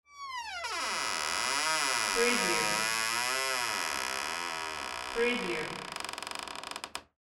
door squeak

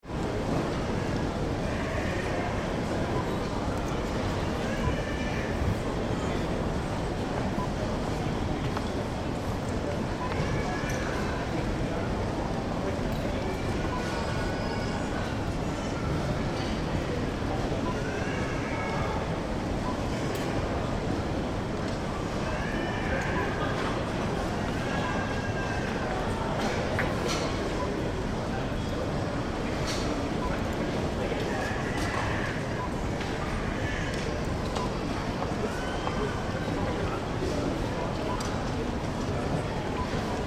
Shopping Centre Kingston; John Lewis

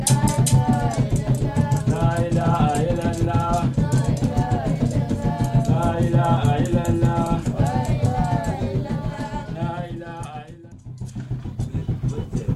chanting

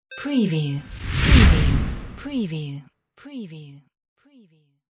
DISTORTED; windy beach audio

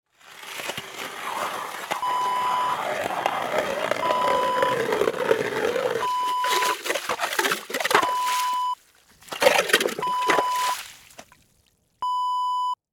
ice auger

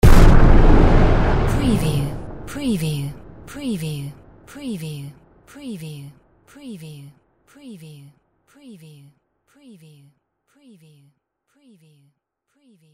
EXP SHORT NO DEBRIS CITY

